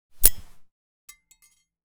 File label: Grenade Under Cook; zps; Weapons; Explosives